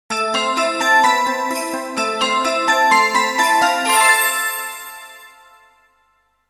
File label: music